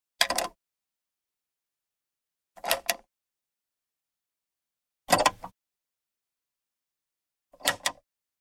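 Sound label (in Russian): ящик небольшого сейфа открывают и закрывают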